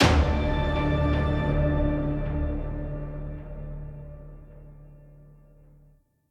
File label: Ambient caves Music End; song of iron